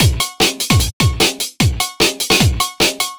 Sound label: 150 Cabin Fever Full, Drum Loops 150bpm; Zero-G; Soundclash Nu Dance Electro; Acidized Wavs